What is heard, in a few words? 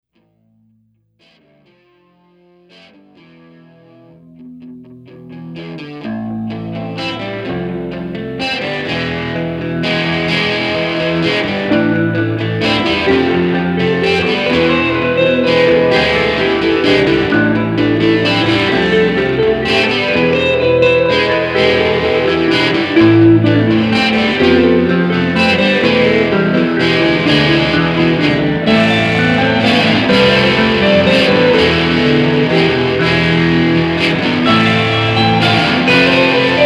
Ghost Waltz (Reprise)